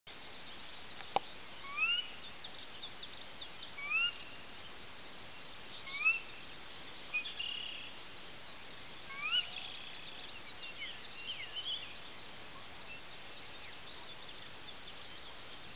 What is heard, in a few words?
porzana carolina